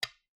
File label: stone sound, kifu